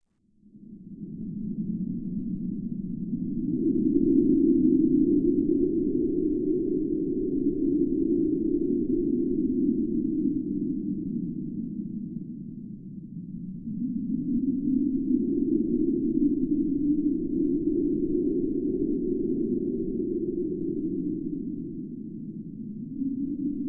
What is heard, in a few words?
wind; kz-endo slide svn temple